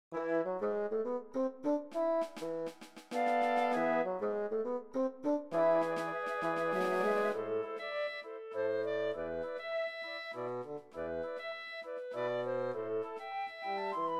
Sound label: Mazurka Caribe